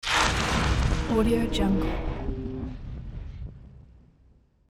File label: evolving metallic morphing tone; hollywoodedge